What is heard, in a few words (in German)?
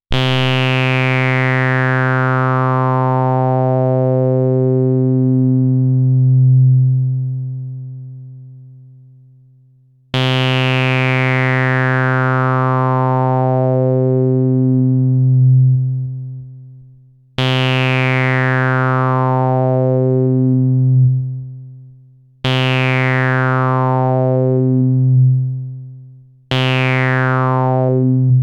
Dreadbox Polyend Medusa Filterhüllkurvenloop